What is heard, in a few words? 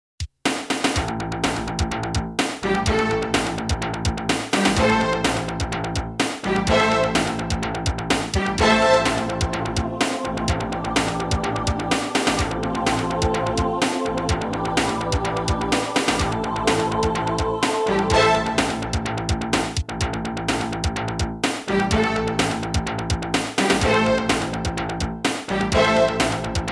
Jingles; 90 DDD Jingles